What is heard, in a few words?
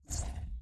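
environment building sold whoosh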